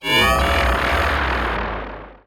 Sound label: roar; metal sonic